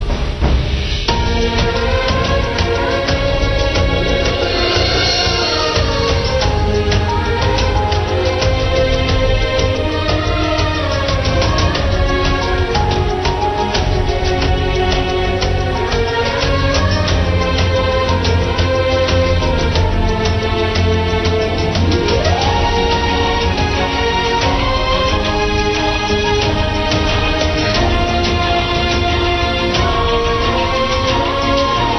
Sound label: Sad Tone